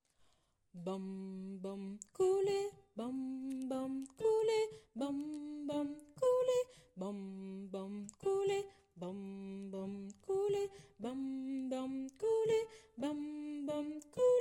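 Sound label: Shosholoza, Basse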